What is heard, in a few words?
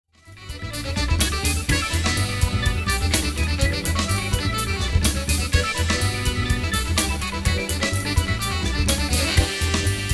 Jazz